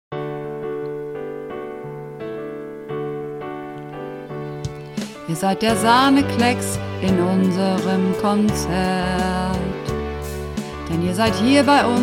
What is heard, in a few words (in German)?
Merci Bass, uebungsaufnahmen; Werbemusik